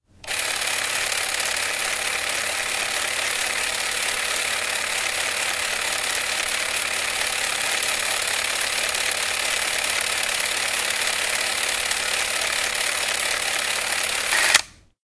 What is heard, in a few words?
camera film 8mm